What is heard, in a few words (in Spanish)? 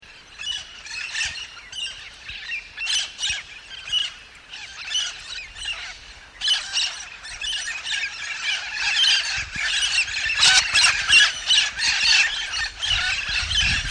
loroalisero, Loro Alisero, Amazona tucumana, Alder Parrot; cantos